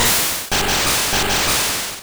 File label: Waterfall; Pokemon; Gen 1; attack moves; RBY